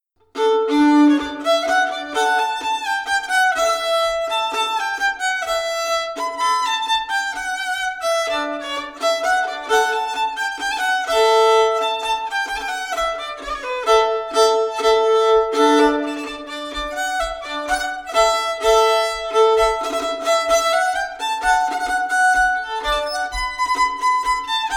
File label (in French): Marche